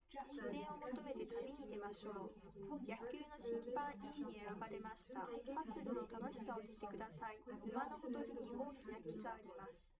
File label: female live rec 1m sim; SASSEC07